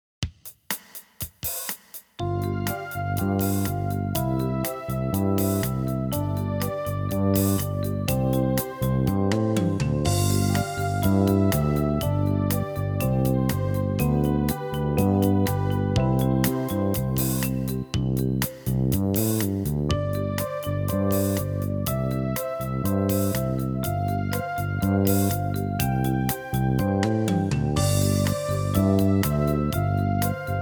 Interval Studies, piano

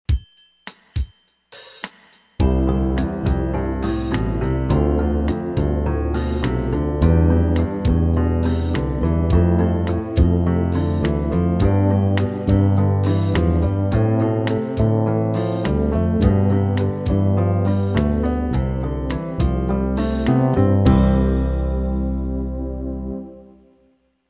Ascending Scales Slow

